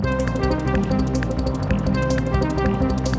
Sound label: Electro